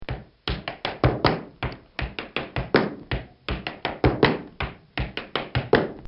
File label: Tap Dance Steps